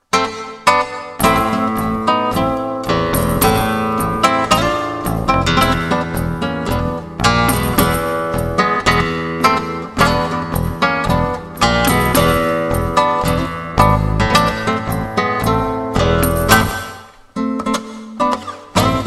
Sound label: tango argentino